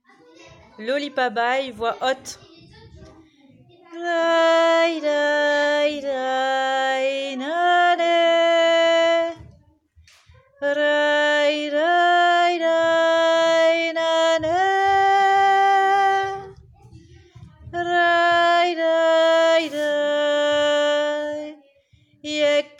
voix haute

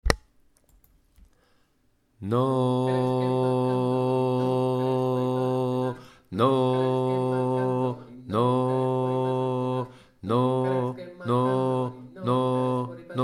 2eme voix percu vocale basse

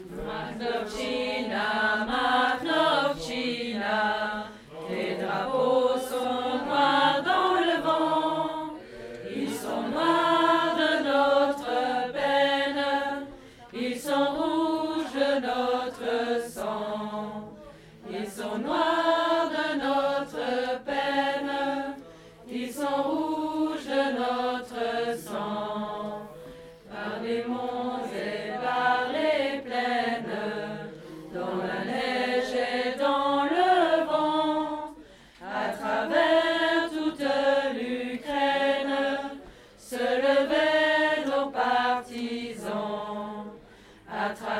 VOIX Alto; Makhnovtchina

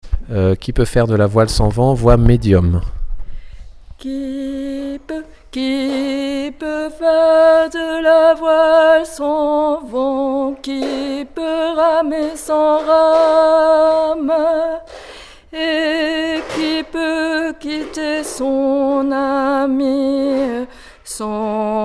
Qui peut faire de la voile sans vent voix medium

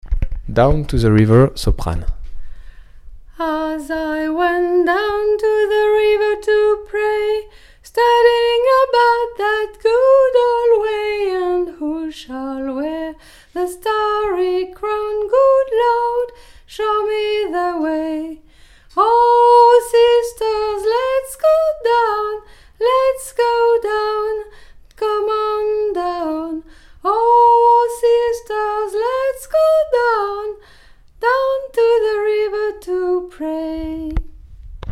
soprane; Down to the river

